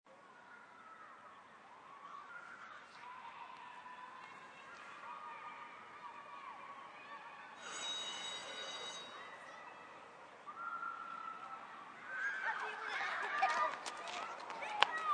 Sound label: Ambience Schoolyard, School yard, Playground, outdoor, 10+ children, recess, bell rings; kids playing, laughing, screaming, yelling